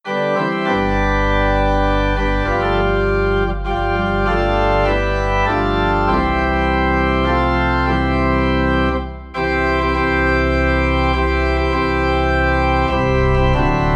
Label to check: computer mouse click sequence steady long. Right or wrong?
Wrong